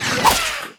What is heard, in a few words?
STINKBUG WAR ATTACK